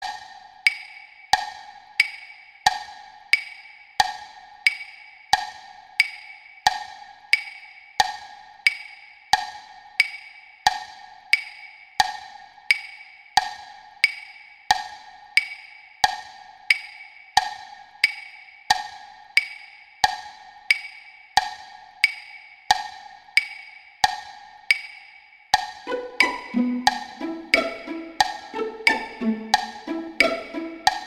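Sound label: Etude No 5 Violin I accompaniment; Wieniawski; Etudes Caprices Op18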